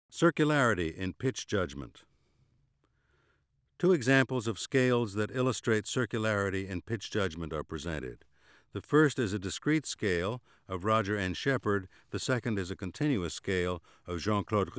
Circularity in pitch judgement, Auditory Demonstrations